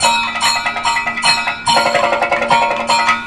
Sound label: KATAK, Indian Traditions; USB Soundscan vol.45 - Indian Traditions; AKAI